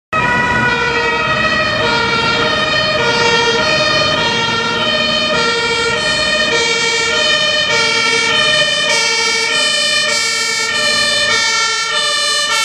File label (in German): Feuerwehrauto Sirene